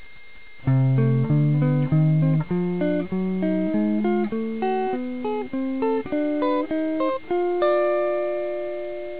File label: sym dim scale 7ths